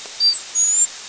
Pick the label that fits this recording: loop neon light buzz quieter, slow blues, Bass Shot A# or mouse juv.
mouse juv